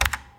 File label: Keyboard